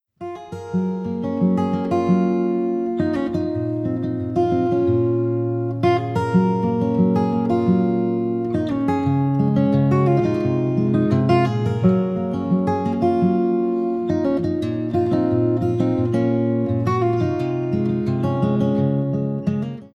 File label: Acoustic Guitar Unprocessed